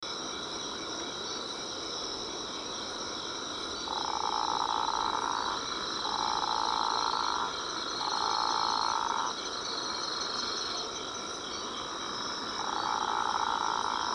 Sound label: Physalaemus atlanticus